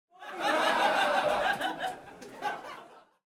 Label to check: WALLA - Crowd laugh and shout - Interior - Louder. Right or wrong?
Right